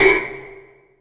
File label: Sweep Oneshot; Sonic Foundry (Sony Creative Software); Esoterik Beatz